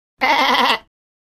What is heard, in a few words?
Goat bleating